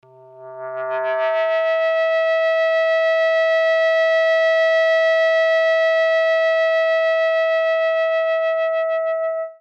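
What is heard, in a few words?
overblown; woodwinds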